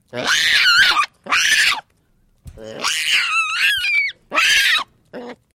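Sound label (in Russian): babuin, baboon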